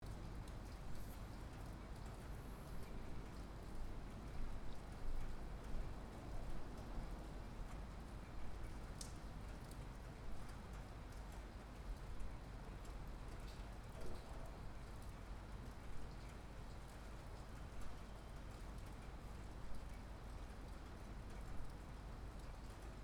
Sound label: Rain out of window thunder; atmosphere